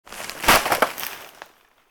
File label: bag drop